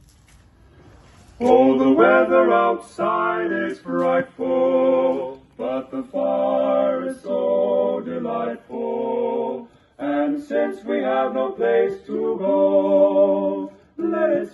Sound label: Singing Penguin